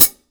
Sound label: Real Closed Hats